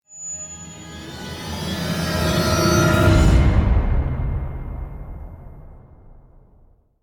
Danger Countdown Stinger

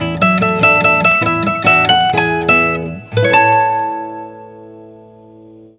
Jingle Best; tamagotchi connection corner shop 2 ds

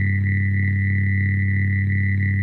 Editor Amb; Worms A Space Oddity; Nintendo Wii

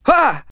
Emote Male Scared; Idle Champions of the Forgotten Realms

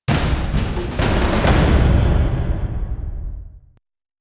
Combat Out; Skellige

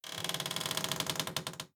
wood creak; Devour

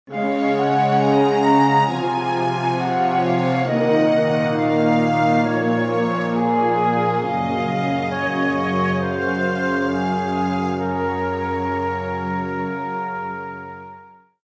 Modern Calm; Civilization Revolution; Xbox

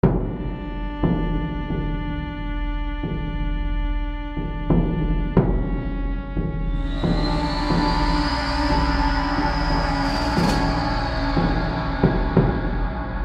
Gameover Scene Roof; Granny 3; Android

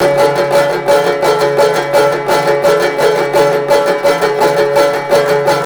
banjo

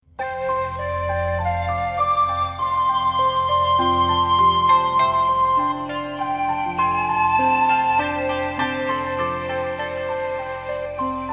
Oriental Flute Harmony; Oriental Empires

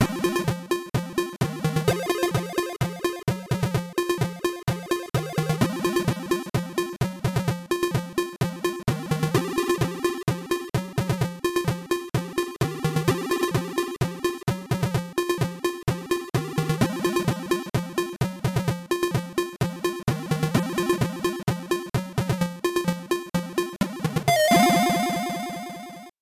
Ladder; Mortal Kombat; sms 1993

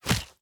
Gunfire Hit; Devour; The Town

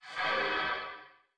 enemy death

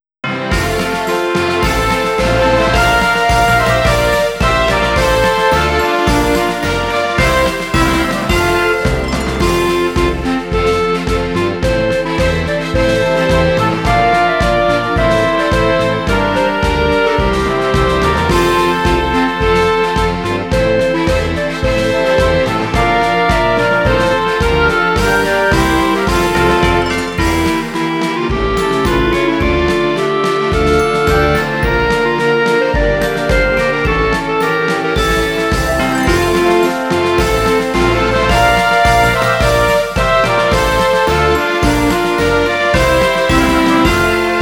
Anthem (Instrumental); Teiou Business High School; Jikkyou Pawafuru Puroyaky Pawapuro Ongakukan 4

